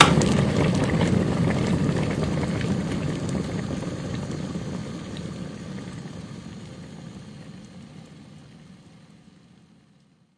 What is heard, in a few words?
Kettle Boil; ModeAudio Found Percussion Hits